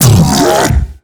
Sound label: Hand Bomber Pain; Warframe; MiTW; Battle Barks